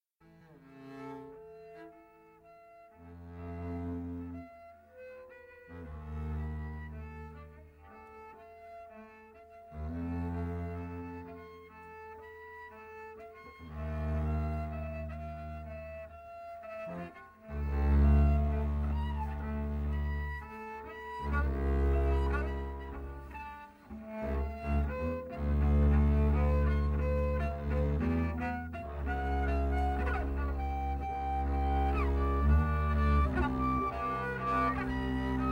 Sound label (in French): Basses profondes